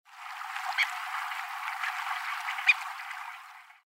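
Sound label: spotted redshank, hyogo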